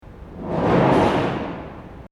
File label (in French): porte coulissante